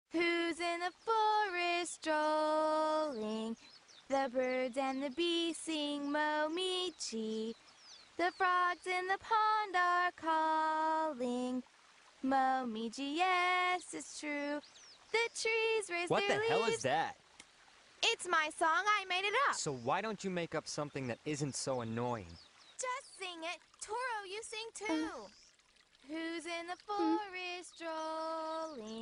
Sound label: Momiji Song Eng